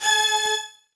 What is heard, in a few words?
Beat